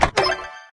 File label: box open; hill climb racing 2